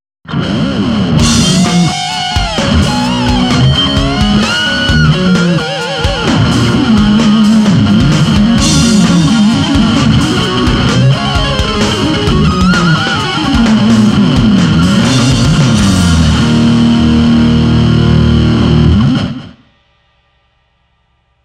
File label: Axe Track test